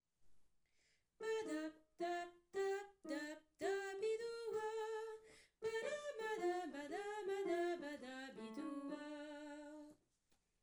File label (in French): canon scats